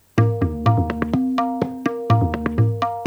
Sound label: Ektal Sample, Tabla; Tabla-Transcriber